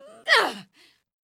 Grunt; Lewd SFX

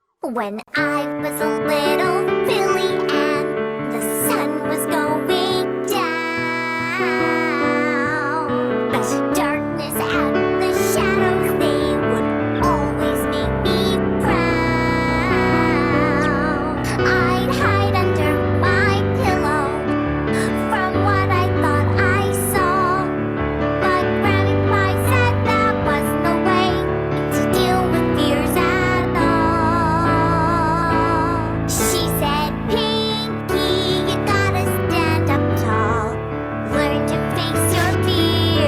Piano, Voiceover; Giggle at the Piano

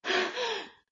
Pant

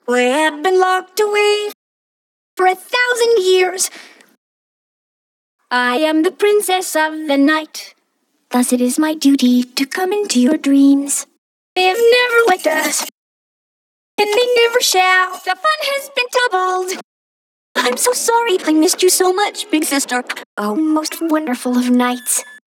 Vocals, 170BPM; Pony Fan Music Remix Stems

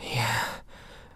Moan

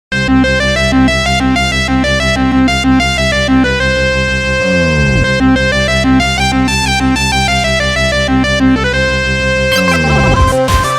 Chiptune

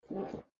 Swallow; Lewd SFX

